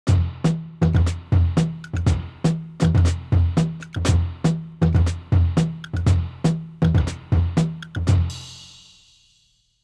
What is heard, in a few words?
Drums; Native Instruments Karriem Riggins Drums